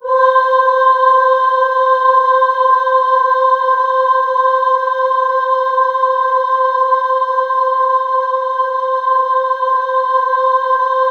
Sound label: C5, Synth Leads